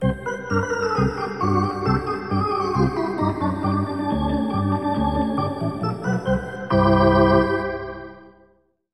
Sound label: Hockey Organ